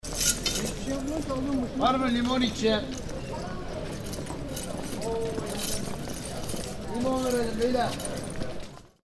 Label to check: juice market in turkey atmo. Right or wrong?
Right